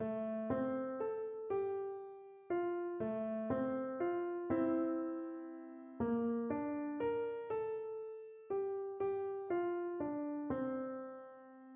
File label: rock-a-bye baby, piano